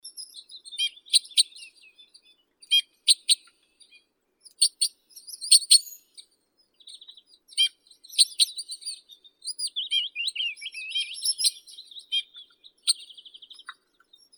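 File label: white-winged crossbill, loxia leucoptera; klest belokrilii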